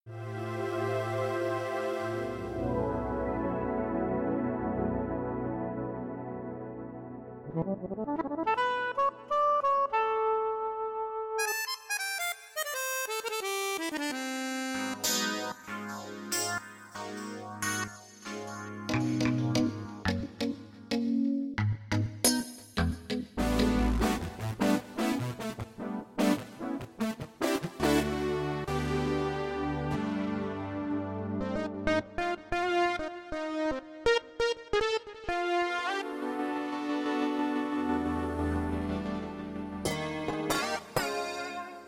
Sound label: RXV synth; RXV synth vol 2